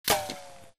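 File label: bullet light ballistic titan ricochet; Titanfall 2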